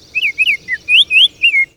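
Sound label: oriole baltimore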